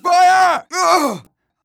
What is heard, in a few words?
fire, player; Team Fortress 2